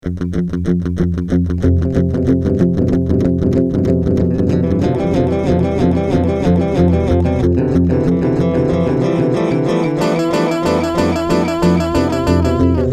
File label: Multi Tap Delay